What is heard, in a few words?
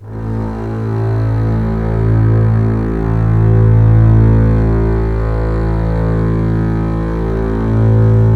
LEG MF L, The Ultimate String Collection; East West